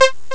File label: echo string; ST-XX Amiga Soundtracker Sample Packs